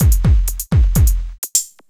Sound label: Beat, Drums & Percussion; Electrocution; Beats